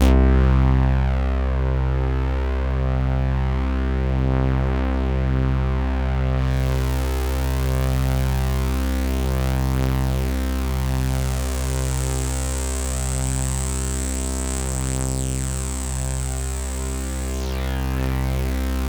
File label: POLY SAW, Roland JV 2080, C2